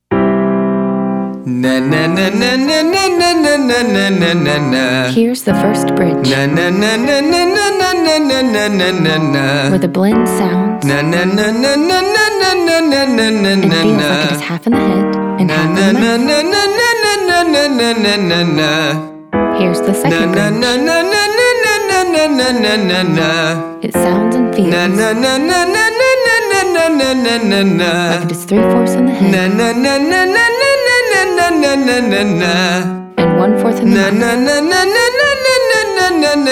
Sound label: Exercise ne ne male